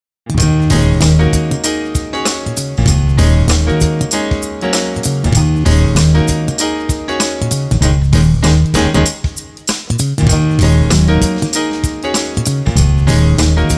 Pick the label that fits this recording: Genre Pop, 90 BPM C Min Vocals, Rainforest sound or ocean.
Genre Pop